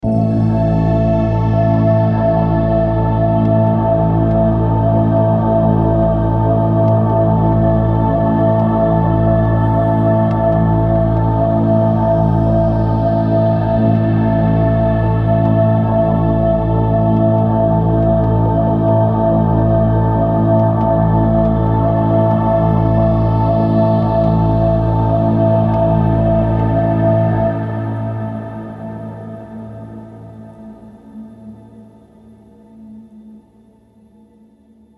Tritone Scale Backing Track

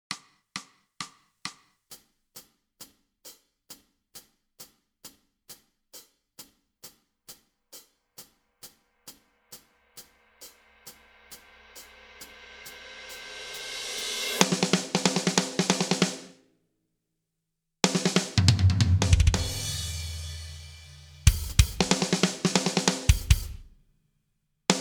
12 string metal backing track

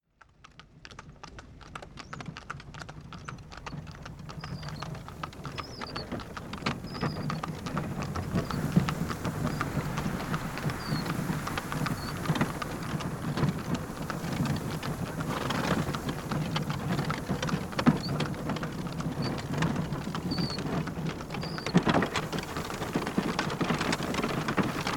AMB Only No Rain; Oregon Trail

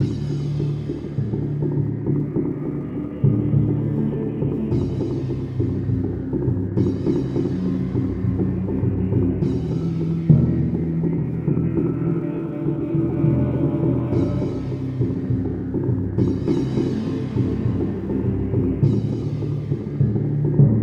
SFX Quiz Show

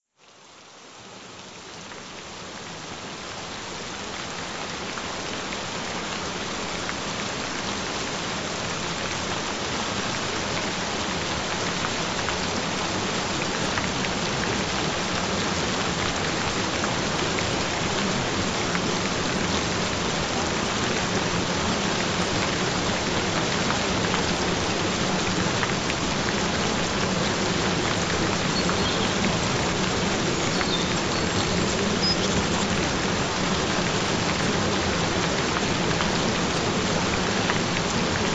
Gentle Rain Sounds; Learn Japanese Fast

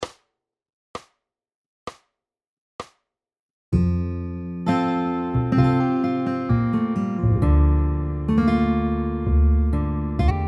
Dreamy Chord Melody; Practice Routine; How to Mix Chords & Licks

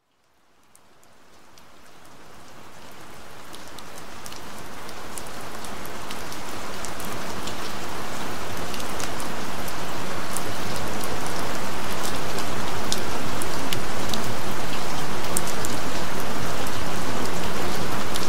Frequency 290 Hz Gentle Rain

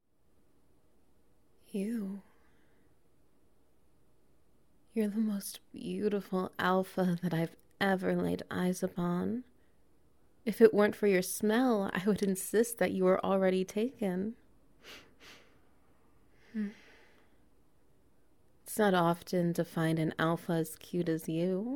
Wet Noises; Alpha Edition

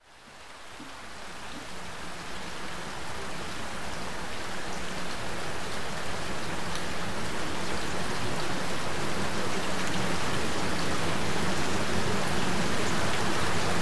Gentle Rain Sound; Dementia Healing Frequency; Ultimate Intelligence; Brain Enhancement

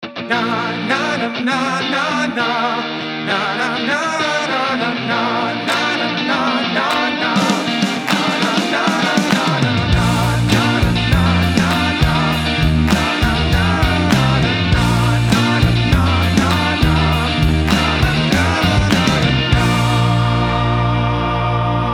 jingle; Checkers

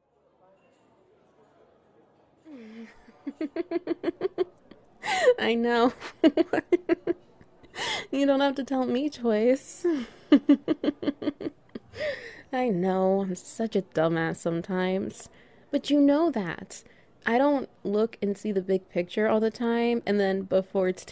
Wet Noises

